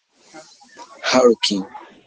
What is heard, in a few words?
Hurricane